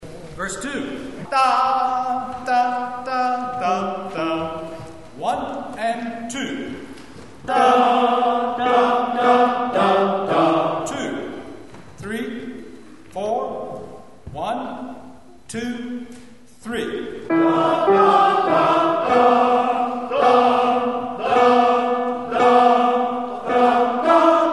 Anvil Chorus TB notes

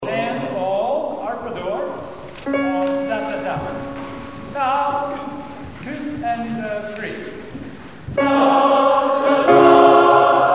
Nabucco SATB notes

